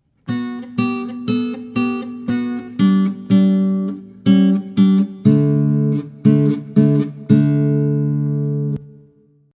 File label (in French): impro solo guitare classique; loto sonore